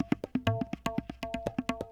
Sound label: PERC LOOP ETHNO 125